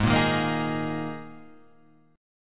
G sharp Suspended 2nd, G sharp, down, Guitar, Standard; G#sus2; strummed; JGuitar